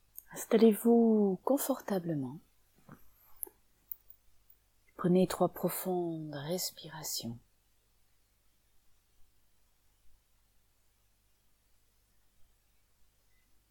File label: RAIN